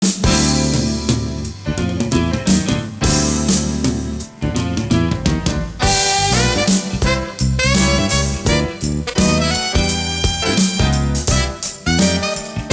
jazz; Invenzioni E Inventori 1

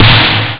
blam, drums snare; OctaMED SoundStudio V1

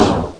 CHAFF; Flying Nightmares Demo; Flight Sims

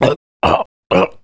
SCI LAST BREATH; Messiah; The Games Machine 76

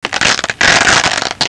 velcro; Sound Effects for Multimedia - Volume 1

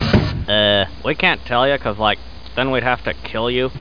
menu

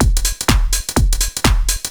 DRUMLOOP; eJay

